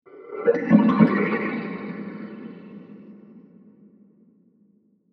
Metallic Science Fiction Swoosh Transition With Delays; Science Fiction Sound Effects; CraftedSignals